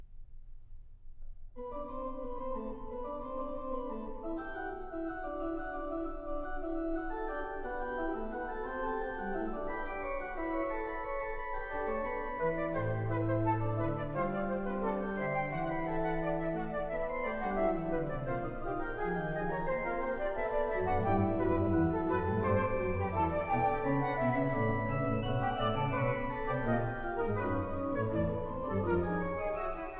Flute and Organ - Fugue

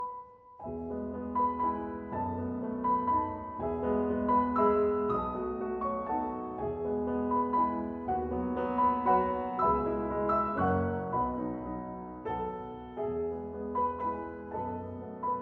Waltzes, For Piano, four Hands, E Major; Brahms

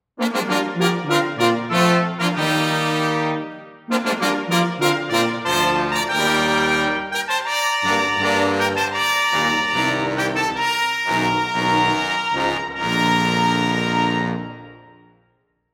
Fanfare, Brass Quintet

